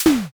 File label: wood snap